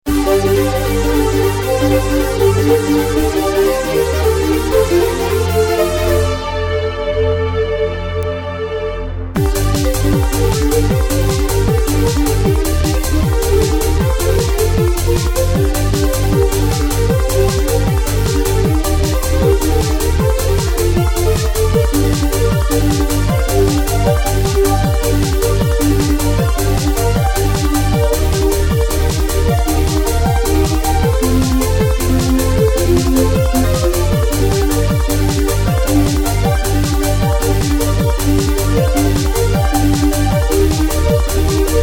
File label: v synth trance